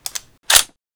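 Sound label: infantry weapons foley; Red Orchestra 41-45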